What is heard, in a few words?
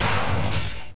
crash; madcars2; radicalplay